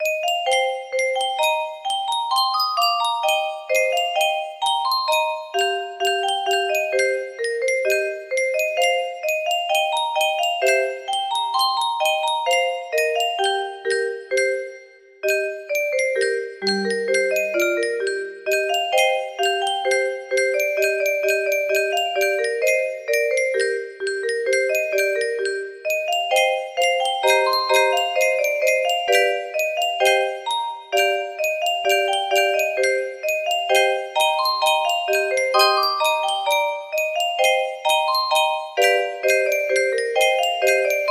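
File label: music box melody; vocaloid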